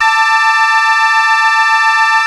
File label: AQUA TARK, Synth